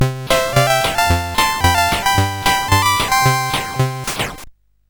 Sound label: Paddle Mania, CSG8580R5